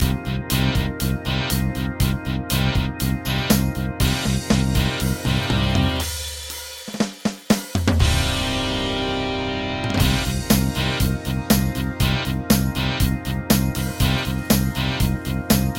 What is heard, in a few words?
Hard Rock